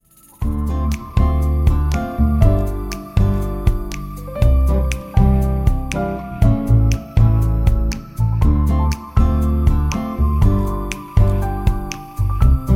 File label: Relaxing Lazy Rhythm; MIUI12